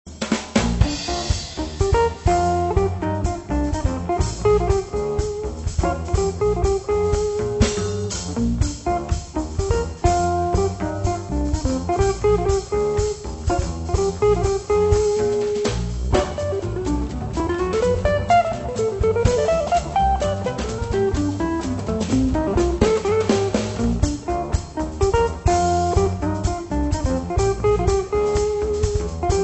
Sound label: rhythm